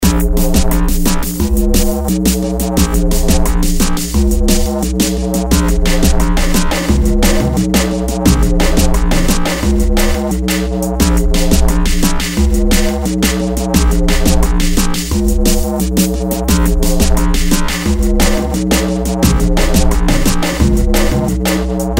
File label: ice clean mix